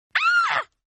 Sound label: Scream Female Short; Screaming; Sound Effects:Voice